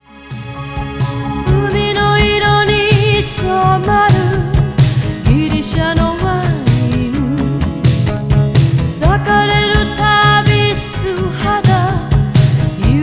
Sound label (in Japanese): 歌謡曲女性ポップス女性